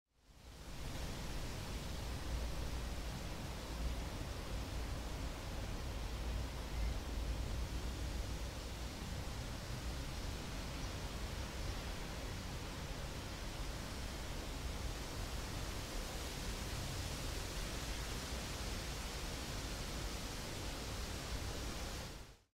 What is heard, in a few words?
Wind Element Inner Spontaneous Sound Example